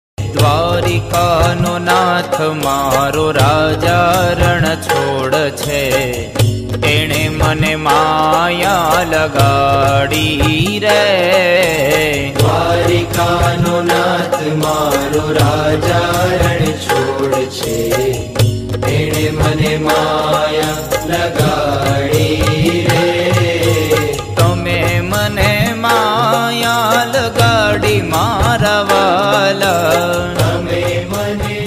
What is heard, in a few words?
Gujarati Ringtone